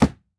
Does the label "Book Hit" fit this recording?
Yes